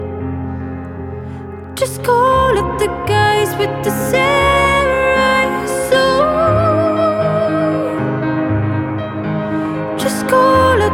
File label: Samurai Swords Acoustic Version